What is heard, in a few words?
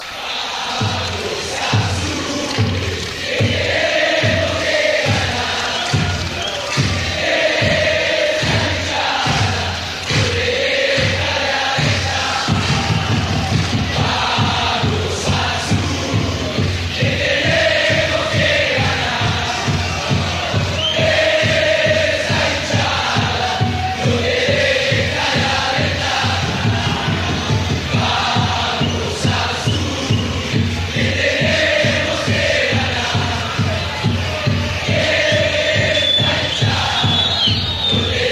lets go blues, fanchants